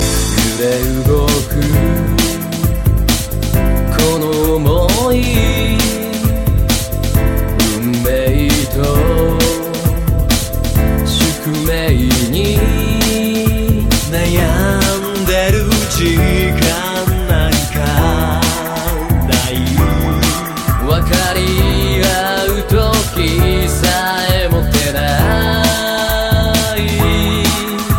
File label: Duet